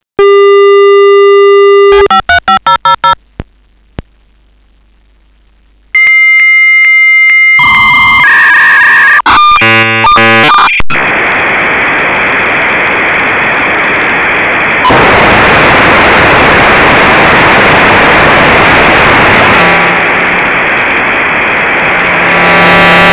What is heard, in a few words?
modem sound